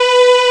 STRNG; sound canvas

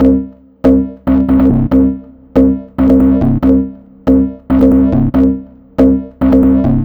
Trance Bassloop 140bpm, Bass Arps

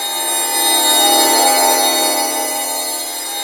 G#4 LASER; Northstar; The Wizard VOL-1; FLUROBELLS

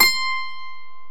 12 STRING; 12&or6 String Gtr; E-MU Producer Series Vol. 2; More Studio Essentials; Composer

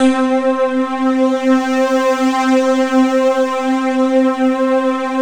SY99; Best Service; Alpine Volksmusik

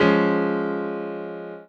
Piano OS; Rondo Brothers Trip-Hop Loops & Samples

